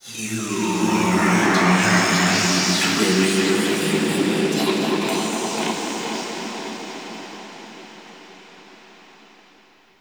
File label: Cave Echoes; The War Within; Golden Maw; Queen